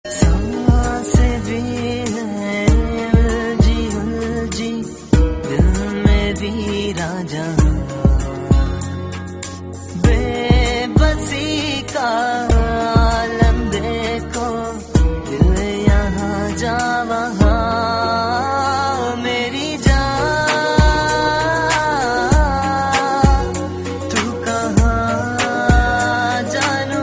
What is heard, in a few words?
hindi new song ringtone